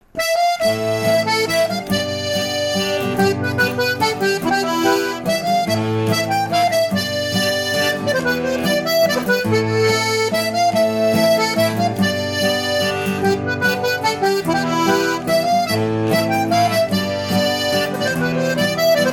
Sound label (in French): valse duo theme